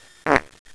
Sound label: fart